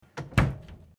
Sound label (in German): Kuehlschrank schliessen